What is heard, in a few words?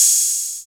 HH HH, Dance